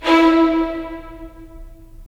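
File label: strings violins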